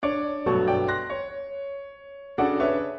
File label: splashing music